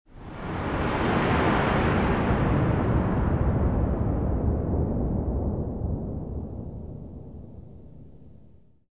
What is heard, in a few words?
Canyon Slow Down Area Transition Whoosh; Journey